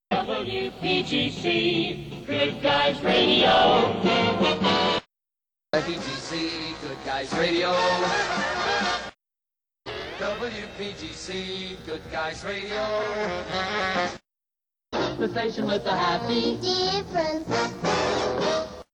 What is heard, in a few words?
jingles montage